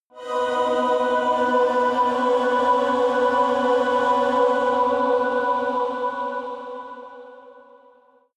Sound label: evil choir chord long; flaechen